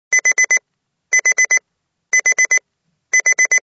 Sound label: BEEP